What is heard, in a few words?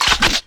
cluster shot; LoL; graves